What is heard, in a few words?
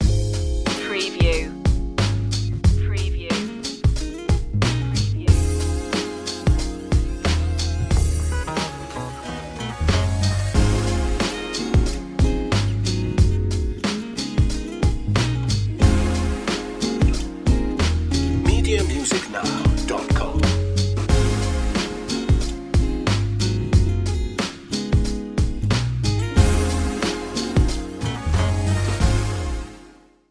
groove on